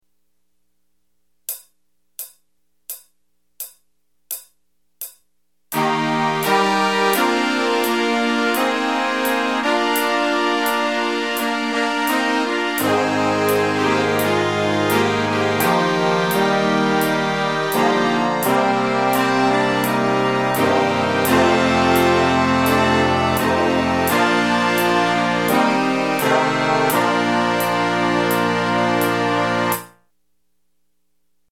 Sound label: all horns no track; Horns Only - No Vocals; Rivercrest Instrumental Music